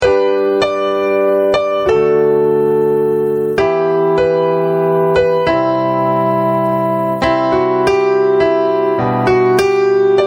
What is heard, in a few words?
Manger BACKING